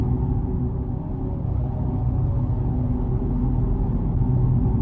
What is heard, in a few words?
looping lofi ambience